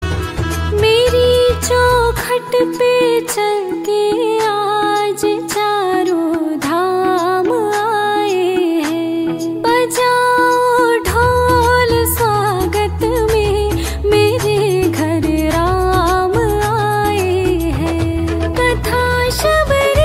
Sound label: Female Version; Ringtone